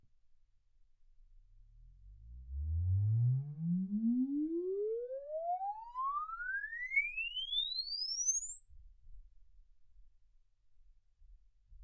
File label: exp swept sinus 10Hz 24kHz